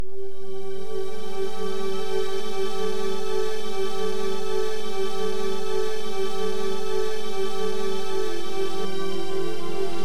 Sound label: synth symmetrical texture 100 bpm; synth loops